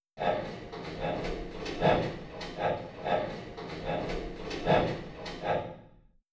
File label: Running